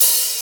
open hi hat one shot e key, Royality free open hat sound tuned to the E note